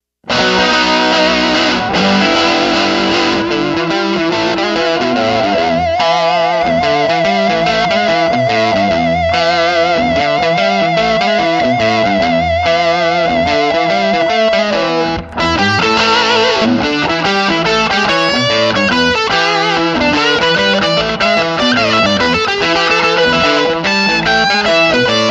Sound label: Lead Input Rhythm T.H. Bridge Lead T.H. Bridge then Neck